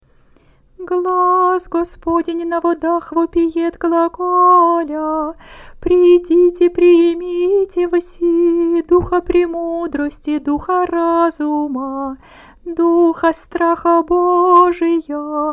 theophany water stichera, singing

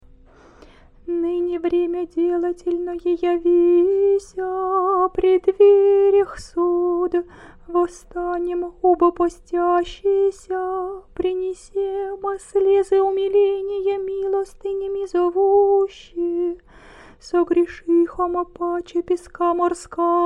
triodion kontakion lent, singing

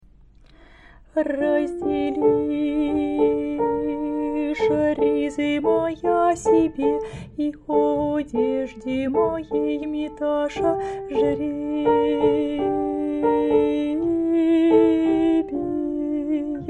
prokeimenon g alt f sopr, singing

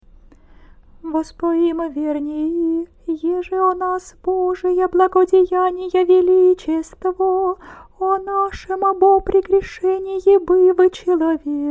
theophany water stichera, singing

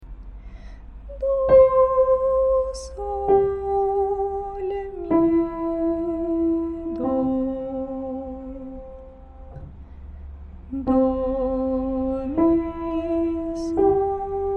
solfege tune, singing

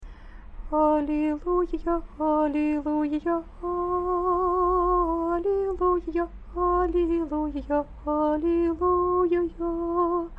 halleluja, singing

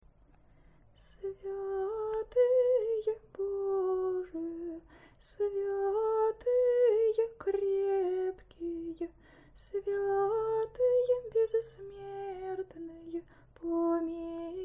trisagion, singing

